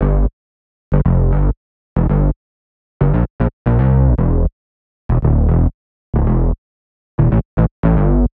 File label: G Bass, 115; Zero-G - Future Wave; Black Tea